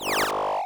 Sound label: MOVE; C64 Dreams; Multi-Sound Default